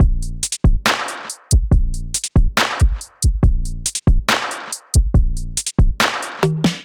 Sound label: Kit 140 bpm, Drums Basic; MIDI Clips; Dear Riban Kit; Ableton; Factory Packs; Skitter and Step